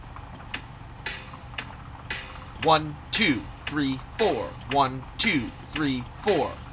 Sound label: quarter note, drill rhythmic notation